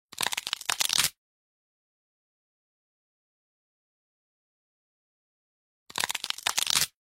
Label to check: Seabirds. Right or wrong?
Wrong